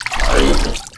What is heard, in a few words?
drown; quake2; qbot